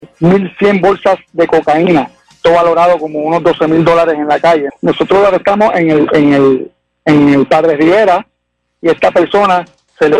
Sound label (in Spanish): guiro; humacao